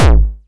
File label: Kick, Drum Hits